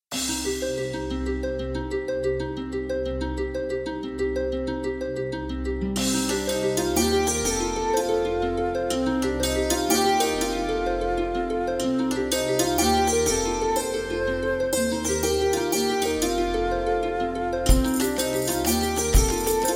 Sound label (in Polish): Dzis do lasu przyszla wiosna instrum